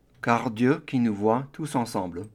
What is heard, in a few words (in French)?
car dieu qui nous foit tous ensemble, songs; Ce n'est qu'un au revoir